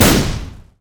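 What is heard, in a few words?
q spell explosion; LoL; ziggs madscientist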